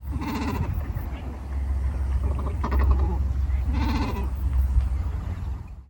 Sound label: kawau, bird song; カワウ; 鳴き声; カツオドリ目; ウ科; 新潟県新潟市北区 福島潟; J-eco 鳥のさえずり図鑑